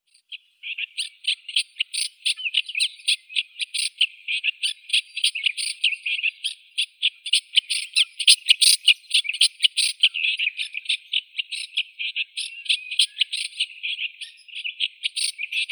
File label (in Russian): sorokoput maskirovannii lanius nubicus, zvuki prirodi